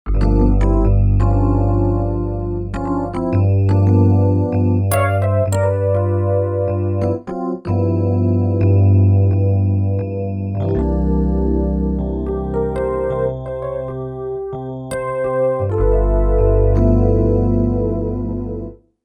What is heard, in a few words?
Dig Rhodes, Roland JV-880; Factory Patches